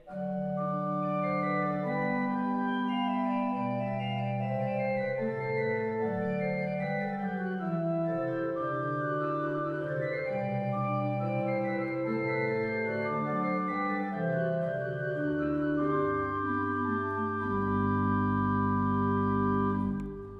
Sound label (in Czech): Rohrflauta 8, Flauta 2; Vsemina